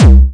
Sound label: Syn Dr, Overloaded Synthetic Drums; style kits